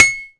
iron small

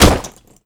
generic kick body, foley; Call of Duty Modern Warfare

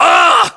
pain, voiceovers

